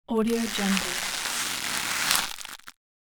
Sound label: foley plastic foil stretch; domestic sounds